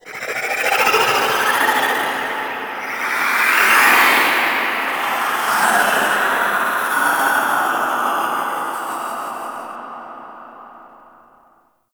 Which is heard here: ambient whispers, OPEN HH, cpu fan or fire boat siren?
ambient whispers